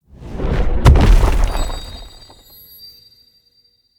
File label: Body Fall; Cinematics; Bromius; Bromius Quest Sap Drink Memory